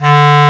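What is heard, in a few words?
Bass Clar mf D1; Digital Sound Factory Virtuoso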